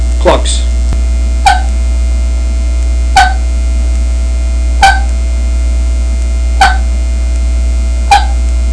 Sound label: snuff can clucks; Southland game calls